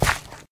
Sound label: gravel, steps; mc gravel; the monster plane; kolmods